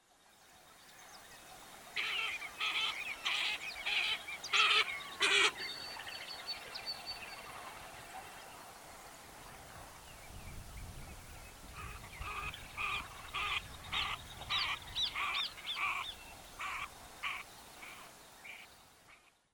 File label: Cinnamon Teal, Spatula cyanoptera cyanoptera, waterfowl